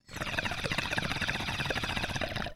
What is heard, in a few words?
Bong Hit